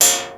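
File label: percussion A, CRAZZY PERC; Best Service ProSamples vol.55 - Retro Sampler; AKAI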